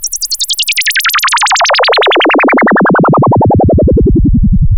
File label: ARP2600, modular syn